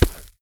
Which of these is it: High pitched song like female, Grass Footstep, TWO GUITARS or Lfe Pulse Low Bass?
Grass Footstep